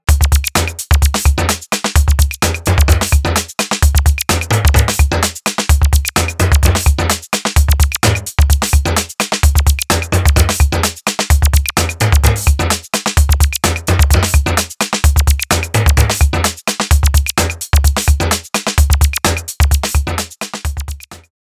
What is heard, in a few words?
electro, acoustic drum machine; electro nation; soniccouture